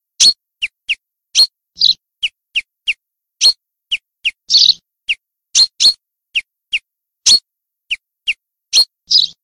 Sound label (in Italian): FRINGUELLO